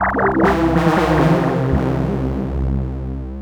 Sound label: SNTH BASS ELCTR 140 A, Techno